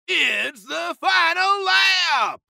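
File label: Surprise Sound; Victory Lane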